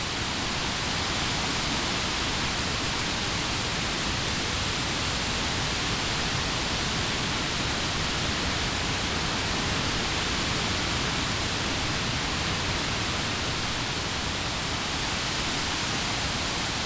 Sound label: AMBIENCE NATURE Summer Broadleaf Forest Wind loop